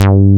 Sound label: JNO SPIK G3, SPIKE BS